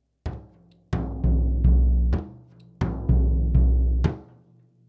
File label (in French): Surdo de variation